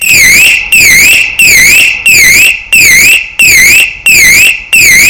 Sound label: Intermittent